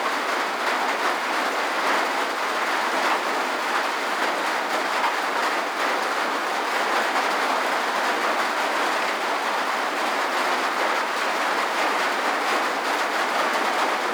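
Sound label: ambience rain light inside normal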